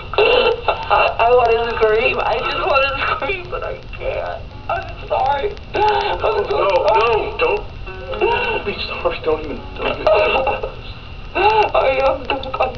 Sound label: scream